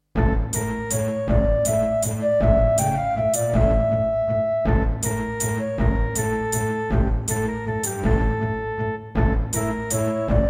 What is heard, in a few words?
Yamaha DB51XG GSMODE